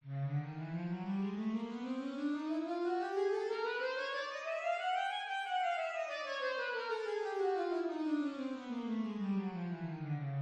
Sax Chromatic Scale

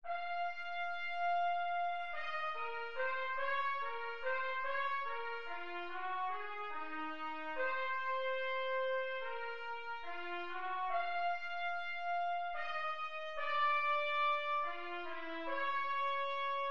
Trumpet Excerpt

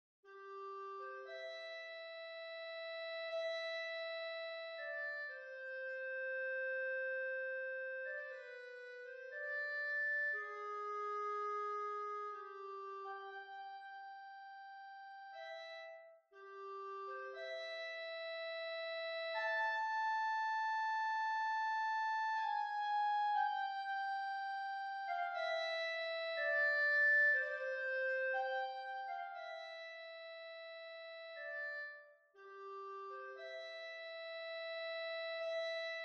Clarinet Track

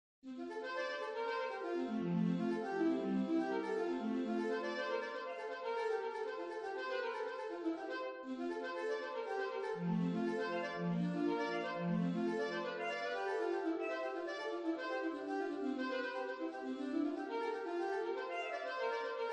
Alto Tenor Sax Track

